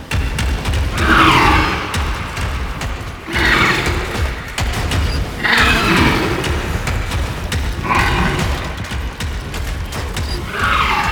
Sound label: Cage Movement Loop; Dactolyst; Warframe; Tau; BoroOverlook